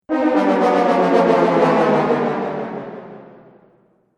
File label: Cartoon Failure Brass Delay; Cartoon Music Sound Effects